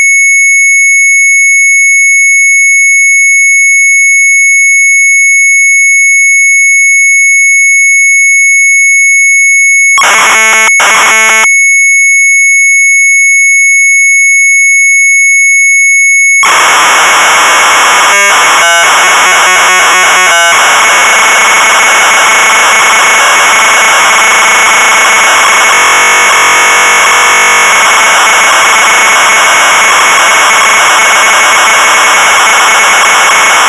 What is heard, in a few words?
MZ800 Tape, sharp; KAT EN MUIS; retromelsarchive